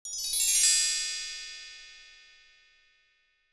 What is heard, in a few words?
magic wand